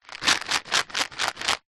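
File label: household medicine vitamin c tablets shake plastic tub lid on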